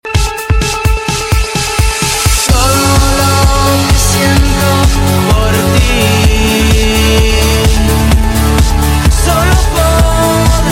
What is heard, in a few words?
latin beat